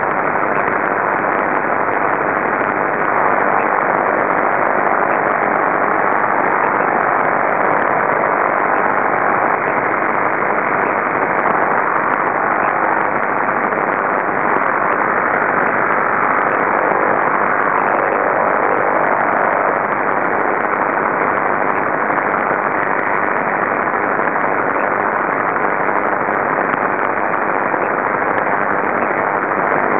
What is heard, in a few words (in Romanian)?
zgomot parazitar 83KHz CW; dcf77 vlf; Perturbatii LF